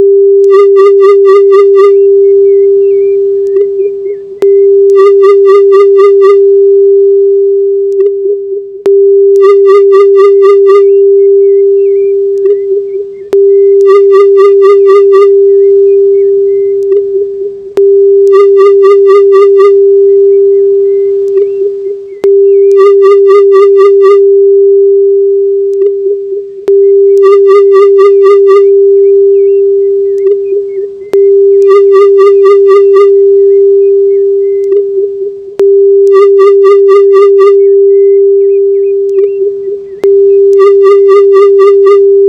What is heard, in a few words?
solfeggio less ambience